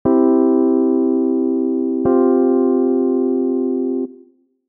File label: c v csus4